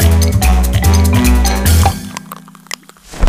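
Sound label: food world music paradise suspens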